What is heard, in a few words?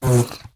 fart; smite; ahpuch skin10